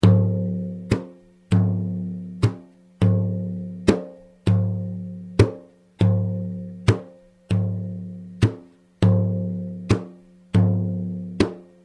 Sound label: tar